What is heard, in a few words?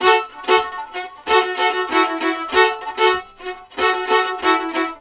VIOLIN, Balkanish Traditions